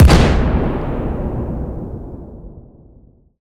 explosion outdoors; weapon; Bluezone Corporation

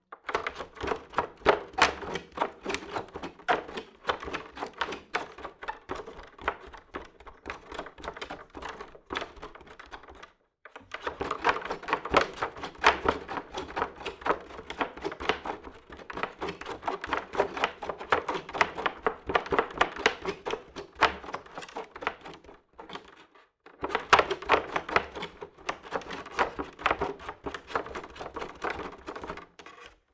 Creaky Wood Barefoot Running; Todd Blankenship

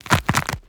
forest wood bark texture crack; Bluezone Corporation

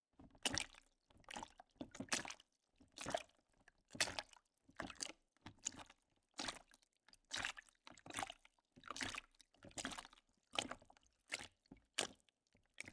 Puddle Walking, footsteps; Todd Blankenship

